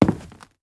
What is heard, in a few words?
Soft Wood Walk; moul